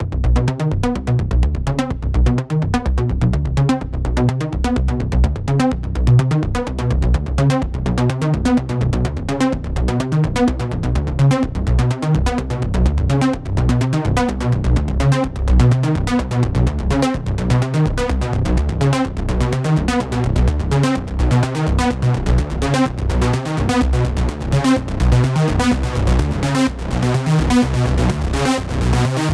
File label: SYNTH LOOP 126 BPM B